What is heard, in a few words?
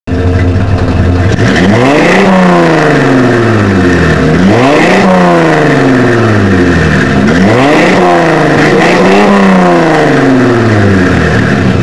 A Few Revs